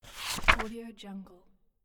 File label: transition